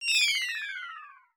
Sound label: falling